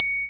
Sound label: triangle perc; drumkits; percs; pi'erre triangle perc; fl studio